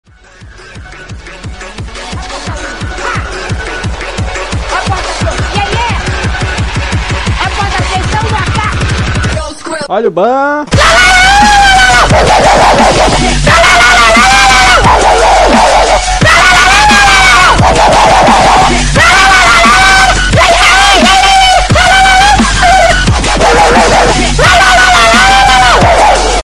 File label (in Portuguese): musica eletronica bizarra